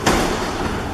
door Open End, Metro

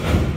door Move End, Metro

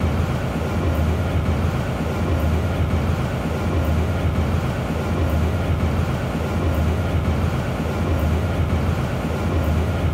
door Move, Metro

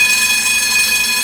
eurotram loop

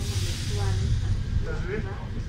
mechanical brake, Metro

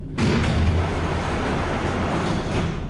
door Open, Metro

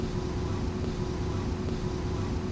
door Move Loop, Metro

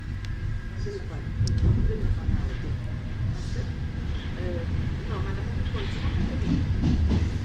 start, Metro

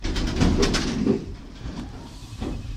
door Open, Metro

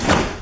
door Close, Metro

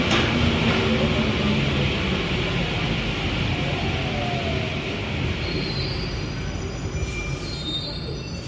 stop, metro

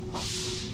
mechanical Break, Metro

